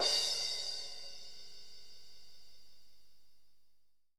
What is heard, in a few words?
crash; Super-Dirt project